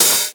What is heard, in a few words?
hat open high noise, Chicago House Drum Hits